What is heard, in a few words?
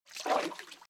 Footsteps - Water - Ankle Level; RadwinIsland